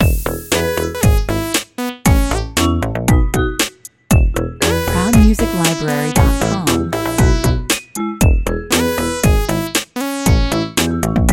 Funny background music in 8 bit retro chip style for arcade, flash game or any project for children, 117 bpm